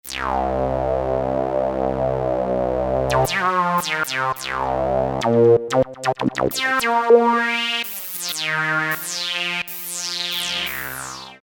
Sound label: Waspy Synth, Roland JP-8000; Factory Patches